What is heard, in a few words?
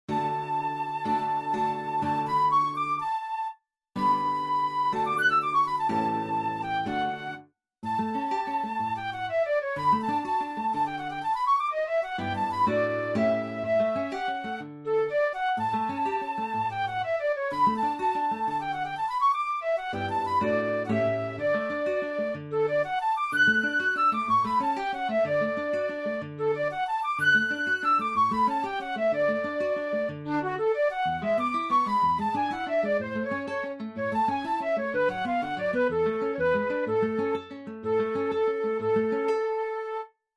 adagio